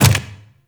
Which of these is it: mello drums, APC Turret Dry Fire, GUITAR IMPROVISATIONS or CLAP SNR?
APC Turret Dry Fire